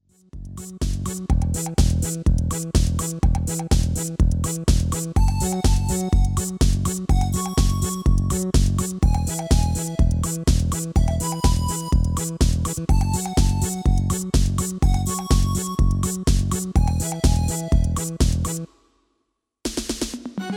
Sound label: MIDI